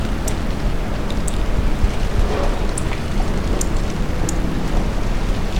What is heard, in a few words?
Thalurania furcata female foraging